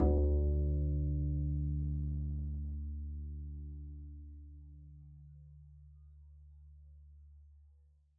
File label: pizz d2, double bass; dsmolken